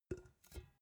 empty can put down soft

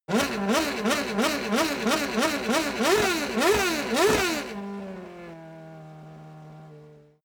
racing car f1 rev medium close

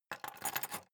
key ring large place table soft

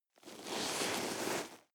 nylon leather soft

